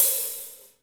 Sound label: HH hh; Best Service Gigapack 2